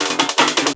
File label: Fill, Drum N Bass Percussion Fills n Breaks